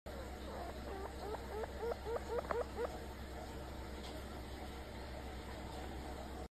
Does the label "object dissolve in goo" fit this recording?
No